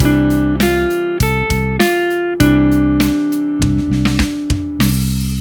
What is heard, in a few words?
D minor triad